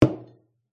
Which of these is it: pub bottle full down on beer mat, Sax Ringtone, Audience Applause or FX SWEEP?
pub bottle full down on beer mat